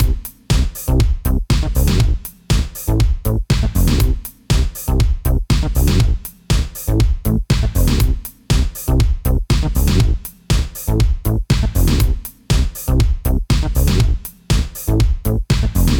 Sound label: Chain Comp On Tuned FX, sidechain compression; sidechain compression in ableton live